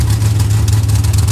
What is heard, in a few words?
ENGINE IDLE